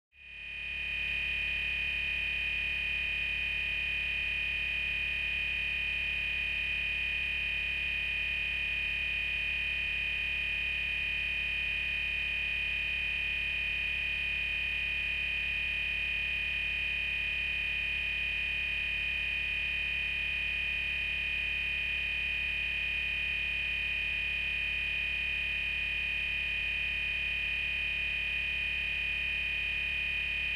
Ballast Hum Electrical, Ballast Hum Electrical. Florescent or Neon Light Hum; buzz